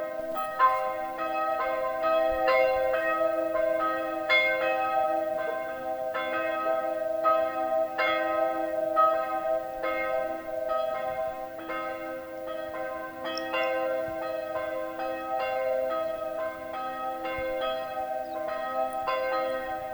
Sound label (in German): Glocke 1 und 2; Bruschied